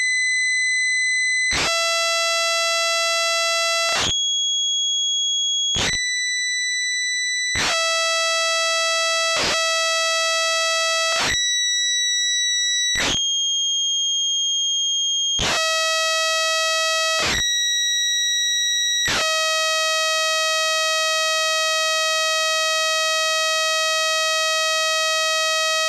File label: noise before, pokey